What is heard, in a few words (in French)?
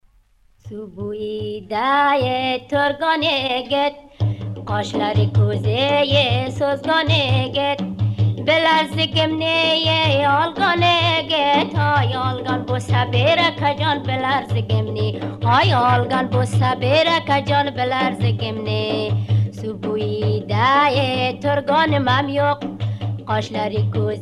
Chant de jeunes filles